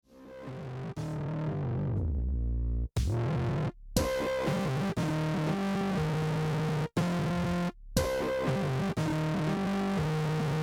own beat cutoff

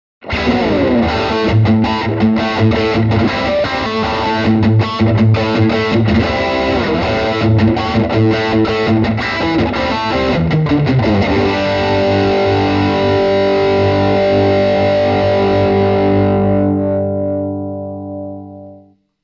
Crunch Heavy UK

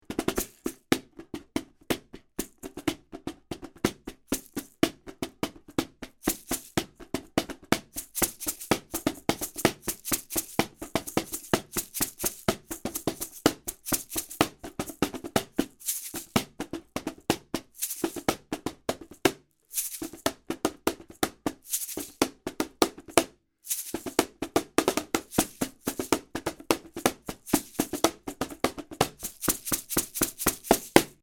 U87 Perc

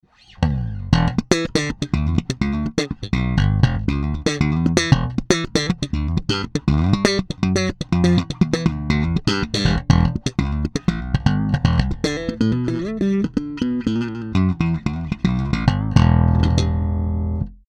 GL JB2 Slap Both PU

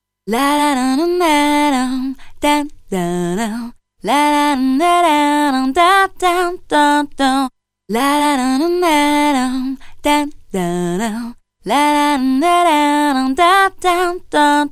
Vocals Original; WES Audio Mimas

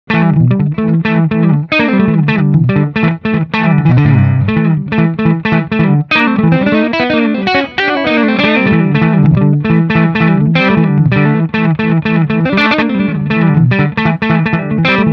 Pitch Shifter

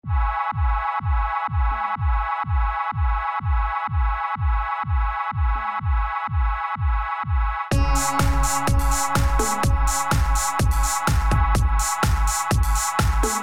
Eden Blast Warped Night Life

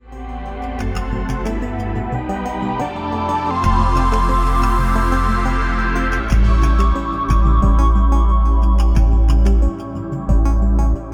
Yamaha Genos Style Jam

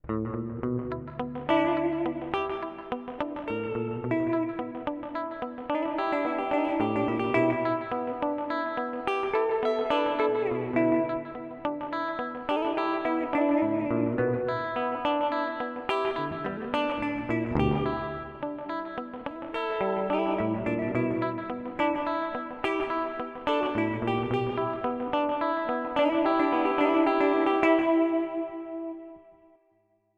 TC Electronic Hall of Fame 2 x4 Plate

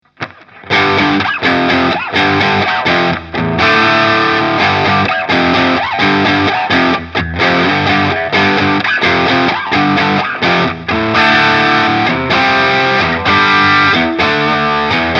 driv in gain 12h color full tone 15h

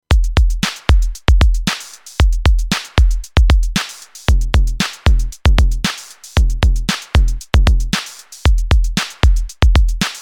Bassdrum

